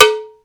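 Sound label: cow bell, drum machine; HR-16; Hollywood Edge; The Big Bad Beatmachine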